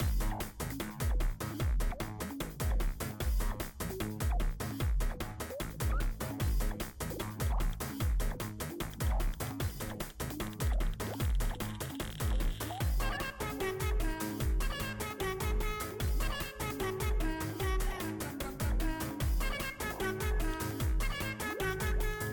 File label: Battle Electro; Tiny Metal